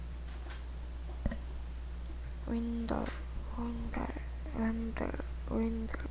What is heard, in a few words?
windy